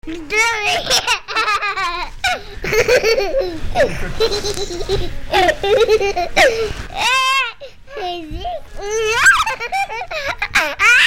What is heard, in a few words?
laughs